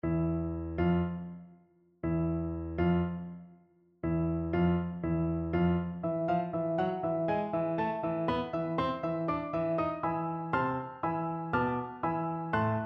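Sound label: xylophone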